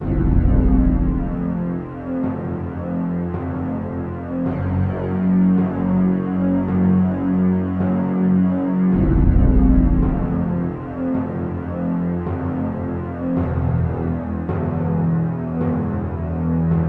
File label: dungeon; Sword of Moonlight; KING'S FIELD